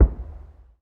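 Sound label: explosion anti-aircraft gun sky burst distant; Explosions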